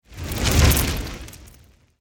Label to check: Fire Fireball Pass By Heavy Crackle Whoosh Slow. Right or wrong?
Right